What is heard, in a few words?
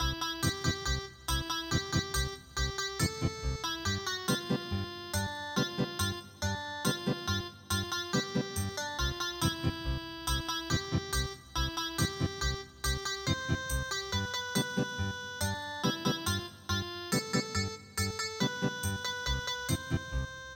Ethnic Guitar